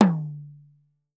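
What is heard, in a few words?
African hand drums; Voice Crystal - Steve Reid Definitive Percussion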